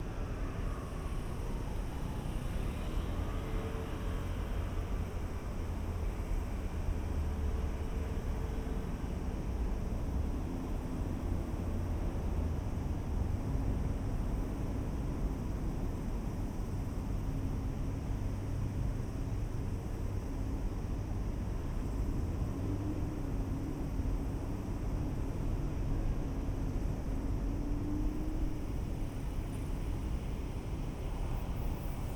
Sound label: Binaural; Field recrding walk